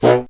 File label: C. Bassoon, solo instruments; Miroslav Vitous - Solo Instruments VOL-1